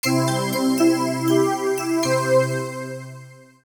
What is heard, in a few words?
jingle